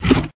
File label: Enemy Attack; Game rip; MiSide